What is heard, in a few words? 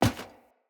Heavy Grunt Boot Walk Wood, Footsteps; Enemy; Sinecure